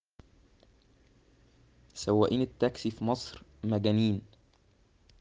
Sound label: traffic